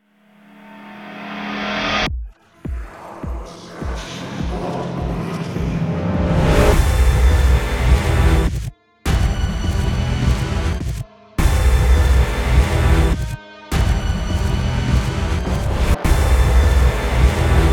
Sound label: Spooky Start